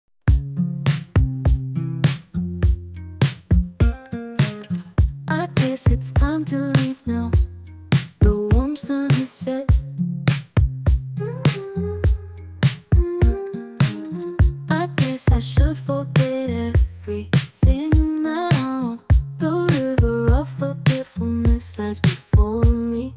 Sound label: Forgive me English Music